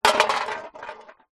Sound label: drink cans x2 empty down on concrete ground; foley